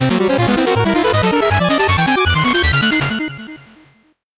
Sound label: 8bit jingle normal